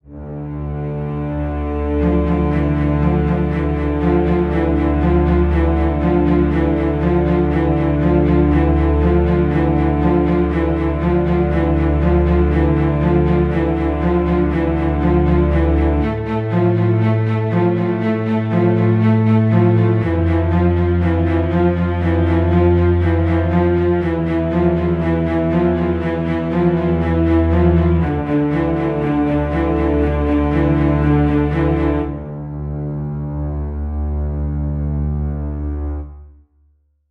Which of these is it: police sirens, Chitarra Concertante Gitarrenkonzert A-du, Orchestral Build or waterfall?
Orchestral Build